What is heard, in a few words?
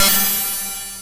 cymb, cymbals